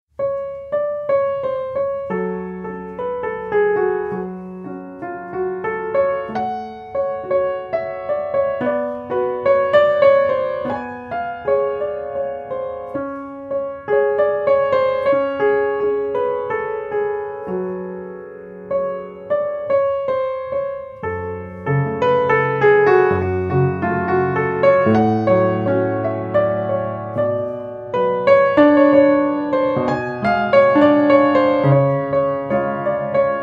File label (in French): au piano